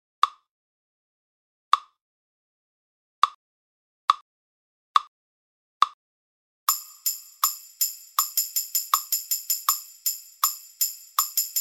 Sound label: BINAIRE, Batterie Reggae; Buchmann; Batterie Reggae VIbrations